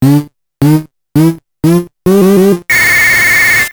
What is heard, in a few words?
Power Up; Hudson Selection vol. 3 PC Genjin